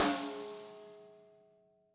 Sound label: tube; STA TRIPLE